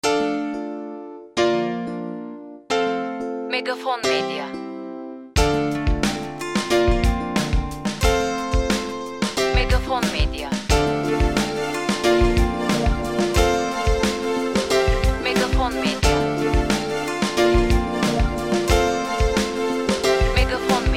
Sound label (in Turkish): SANTRAL MÜZİK